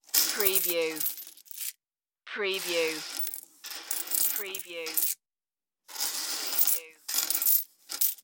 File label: put single coins on table